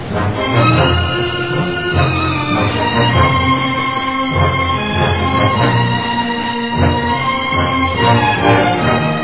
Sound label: tune; quake2; chaos; sin